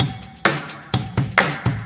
Housebeats, 128BPM; Vengeance Samples; VEH2 Loops

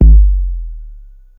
Bass Drop, 808; Street Anthems Sound Kit

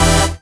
Synth Shots; Vengeance Samples

